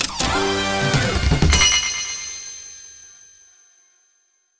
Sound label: Engineering Quest Success; Bust n Rush